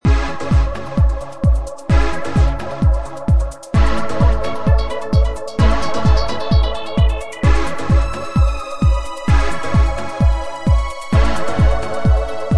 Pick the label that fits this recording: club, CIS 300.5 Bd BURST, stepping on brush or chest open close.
club